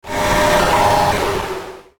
Whoosh Static Low Fi Descend; Blastwave

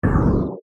Whoosh Short Flange; Production Element, Imaging Element

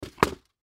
Box Set Down Tin Foil; Household; Set Downs; Blastwave

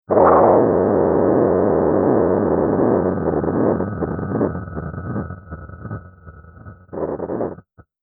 Chaotic Electronic Wind, whoosh

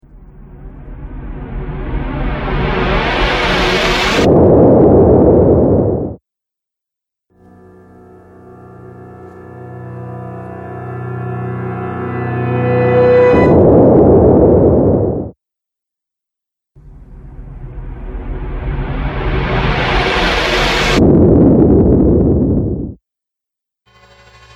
Logo Intros Sound Effect Collection, trailer

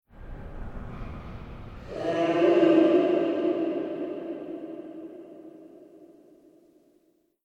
Scream Flashback Vocal Flash Scary Haunted; Horror Transition; Evil Voice; Evil Voices

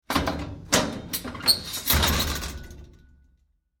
Household Fireplace Door Open And Hang Metal

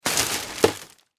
Household Trash Bag Throw Trash In Slow; Garbage

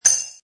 Metal Nut drop; Metallic; Multimedia; Lynne Music

